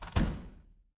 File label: door close